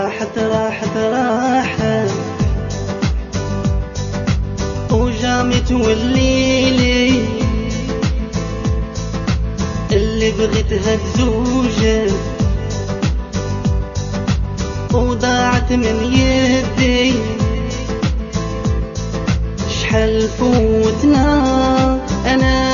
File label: Arabic